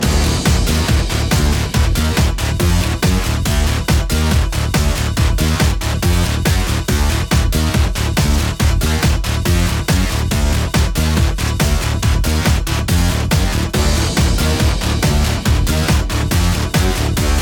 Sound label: main game theme loop